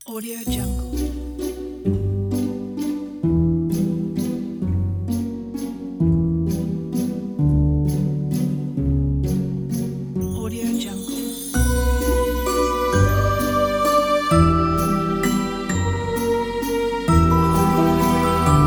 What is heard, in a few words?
magical story